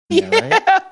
laughing saying yeah

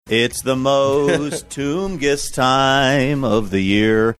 singing

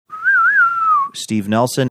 whistling saying

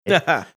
chuckle

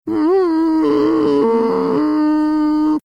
drawn out mmmmmmmmm

